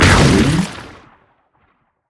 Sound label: stun; LoL; velkoz